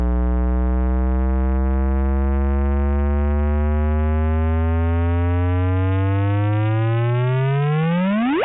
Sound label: chirp, merging bh